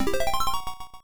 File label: Secret Unlock; Relevos Snowboarding; MSX; Alternative 1, Unused